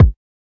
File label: kik